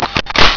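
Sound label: AMMO SOUND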